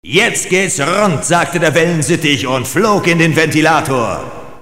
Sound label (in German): Jingels